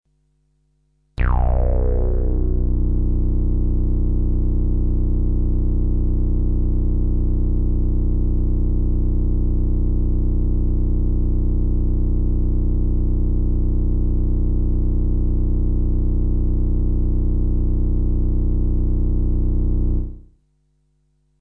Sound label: buzz; Buzz1 21s